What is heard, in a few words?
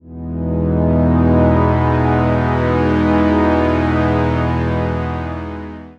lush synthwave pad in e major